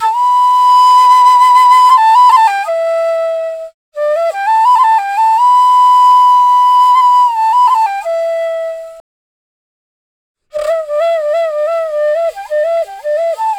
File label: Hip Hop Flute Loop 90 BPM A